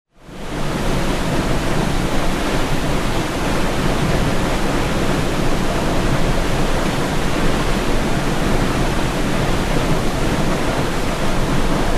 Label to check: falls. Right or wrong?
Right